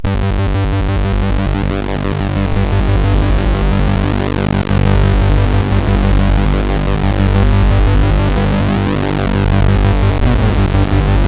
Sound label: Intro sid MOS6581R4; Protovision